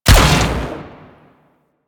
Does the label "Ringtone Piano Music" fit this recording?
No